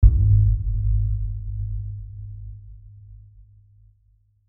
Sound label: Hit Warble Reverb Deep Airy, Warble Swell Rear To Front; Production Element Warble Swell Rear To Front Surround Sound Imaging Element Accent Transition; Blastwave